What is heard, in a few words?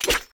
Sword Fight Metal; Mighty Party; Android